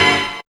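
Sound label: tek chord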